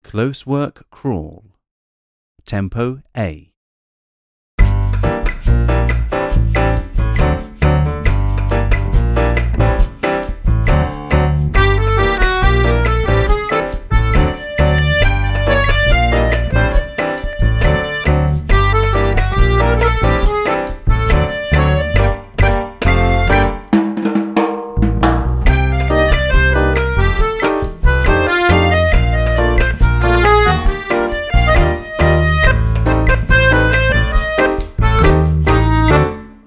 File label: Closework crawl tempo A, Tap; Grade 1 Tap